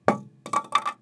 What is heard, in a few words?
drop left, small styrofoam longthin plank